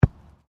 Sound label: SOCCER BALL BOUNCE GRASS